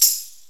TAM

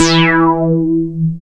BASS, Tekno Industrial; East West

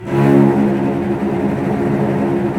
STR VCS; Roland - LA Composer vol. 4

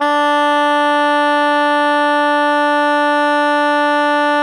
SOP FF D 3, Saxophones; Greytsounds; Philip Wolfe David Crozier's Saxophones

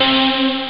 voice; coma; Amiga Soundtracker Sample Packs

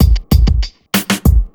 BEAT, Grunge Hip Hop; USB Soundscan vol.34; AKAI

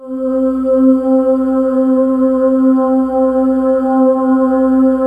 DARK VOX, JV-1080; Syntec; Wall of Sounds

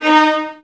violins F; ILIO; Synclavier Strings

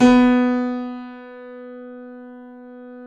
KEY B 2, YC7 Piano mf; Roland - Rhythm Section

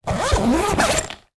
Zipper, household rhythm samples hits

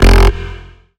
Bass F, Bass Synth

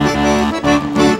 ACCORD HITS; Best Service; World Colours

